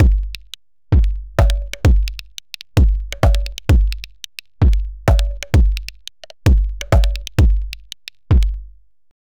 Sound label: slow drum loop; 130 bpm